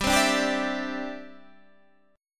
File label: D 7th Suspended 2nd Suspended 4th, G